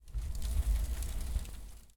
fire

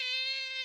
fox; Animals

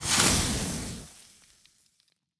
armbomb, Weapons

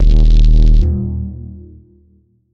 rad pulse

